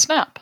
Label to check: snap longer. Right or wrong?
Right